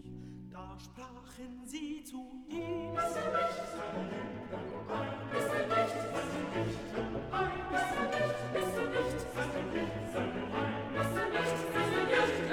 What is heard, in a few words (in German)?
SOPRAN, Stimmen mit Klavier; Johannes Passion